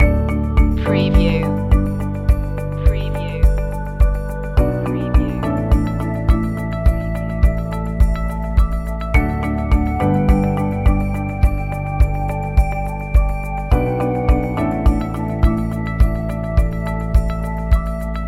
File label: bike ride loop